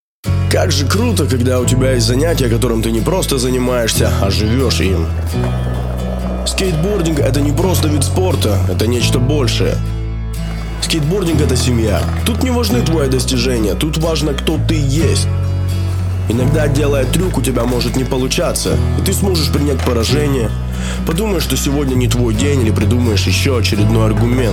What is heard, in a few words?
skate music